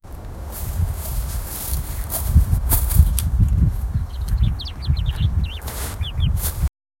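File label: light wind brushing through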